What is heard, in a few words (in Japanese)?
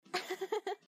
笑い